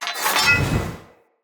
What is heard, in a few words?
Sword Whoosh Accent Heavy; Weapons; Player; Swords; Fenn Jotar Sword